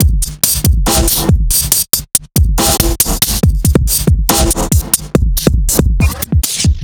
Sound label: drum